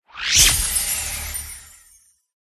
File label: piggy; pet